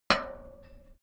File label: small metal drop; industrie wirtschaft; metall